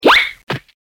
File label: ZIP HIT